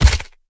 Collisions FS Slow; Lurker; Evolve; Gorgon

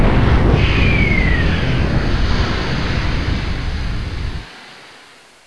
beam fire

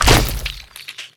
Tongue Grab Hit; Evolve; Behemoth

